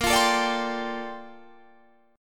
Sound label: A sharp Major 13th, Guitar Standard; A#M13; strummed; JGuitar